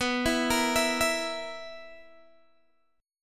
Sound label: B Major 7th Suspended 4th B down Guitar DADGAD